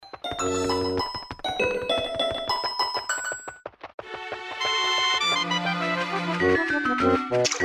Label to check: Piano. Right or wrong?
Wrong